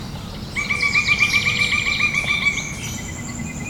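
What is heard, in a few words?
Pileated woodpecker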